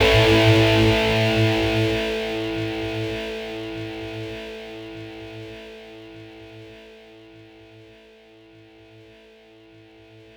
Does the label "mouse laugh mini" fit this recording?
No